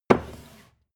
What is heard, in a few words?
book shelve hard